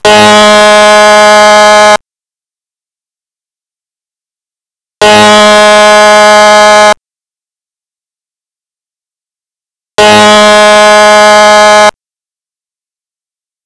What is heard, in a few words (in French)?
alerte rupture de barrage